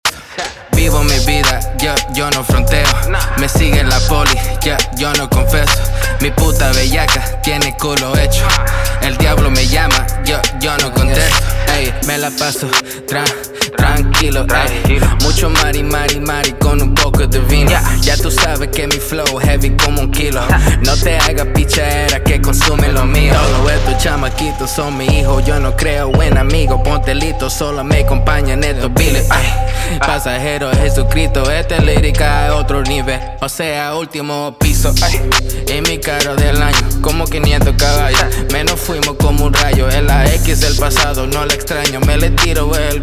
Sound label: Spanish Rap